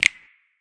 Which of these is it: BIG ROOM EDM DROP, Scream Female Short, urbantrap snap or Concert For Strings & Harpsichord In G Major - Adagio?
urbantrap snap